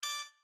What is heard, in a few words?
Turret Button